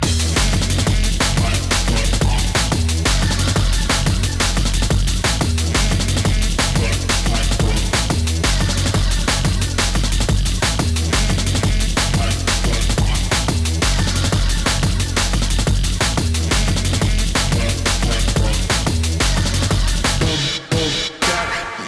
dnb; zps

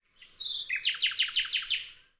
nightingale; zps

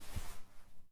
Grenade Under Release; zps; Weapons; Explosives

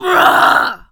attack, Zombies; zps; Z Waitress

